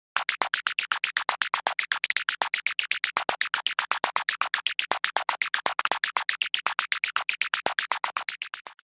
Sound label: FM Hat FM; Kobol